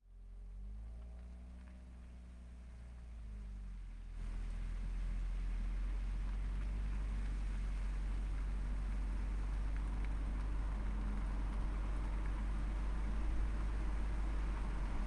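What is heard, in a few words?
toyota chr turbo onboard slow drive short stop exhaust distant; dpa4062; Sonniss GDC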